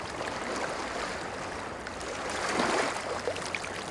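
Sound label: Ambience Golf Crowd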